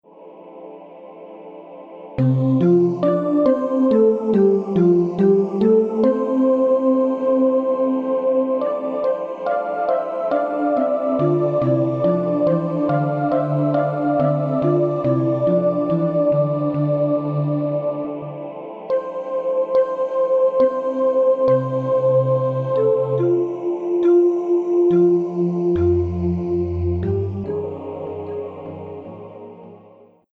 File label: MIDI; Music Relics